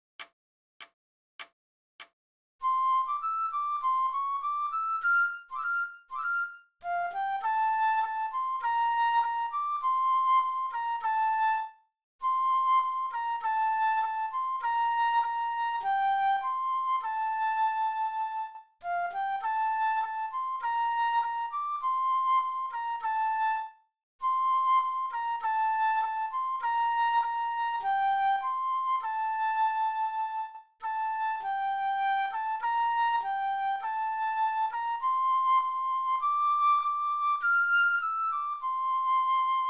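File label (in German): Floete, 100 bpm; Muss i' denn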